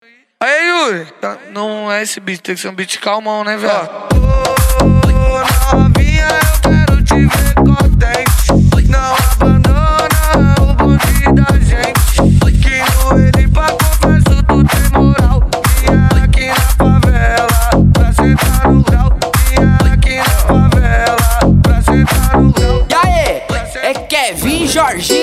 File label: retro